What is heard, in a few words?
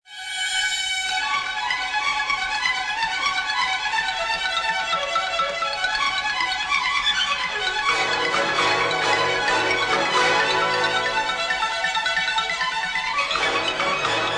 mallets